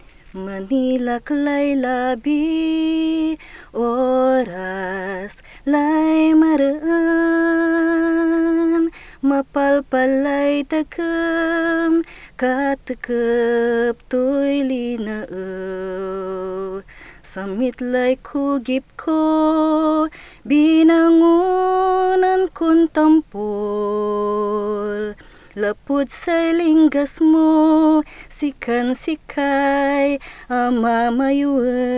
song, Pangasinense; Language Documentation Training Center Project; Malinak Lay Labi

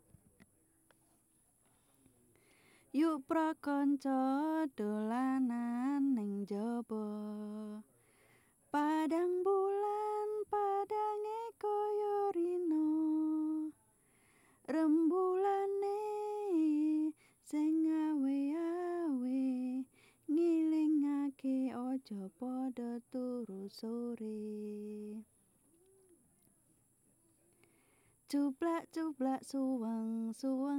Padhang Bulan, Javanese Songs; Pekalongan; Yuk pro konco dolanan neng njobo; Let's all play outside

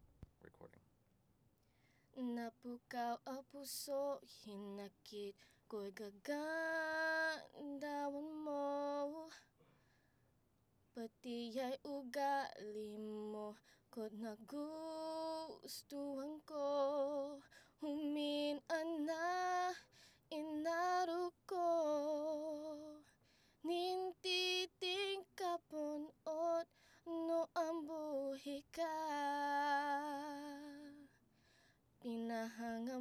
song, tina sambal

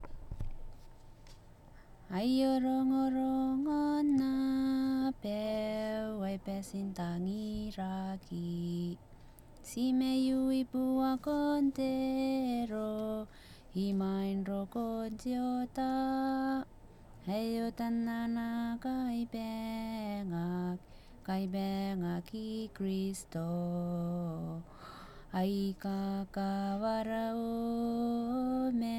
i-Kiribati Song

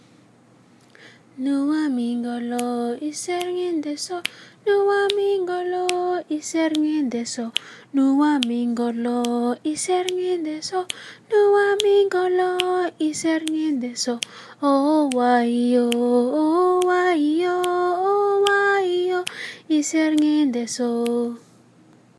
River Song, naleh; Nuwa-iming kolo, iser ngin daso.

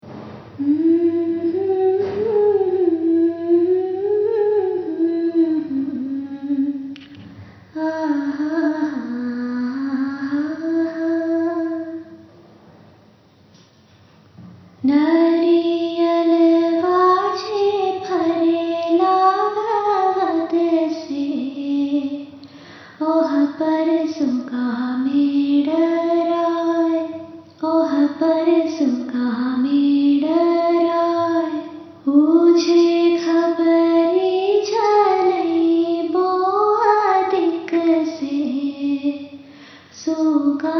Here is a song about the festival; Chhath Puja is a festival that will be celebrated this year November 15-18.; bhojpuri

